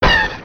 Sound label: Laser quick